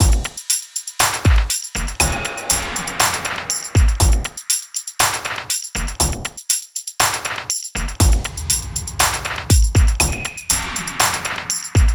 120 Beat 707

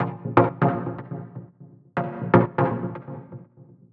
Music Groove 122 Bpm A-

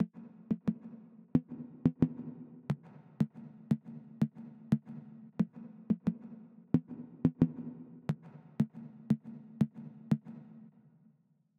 808s 89 C

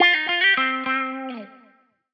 guitar phrase Cm; long road

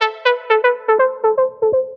Synth Fill 122 G

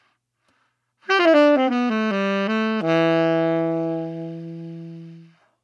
Saxophone 85bpm Am Chill Smooth

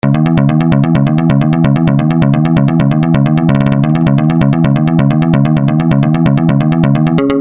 bass loop G

